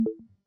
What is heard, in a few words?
click fail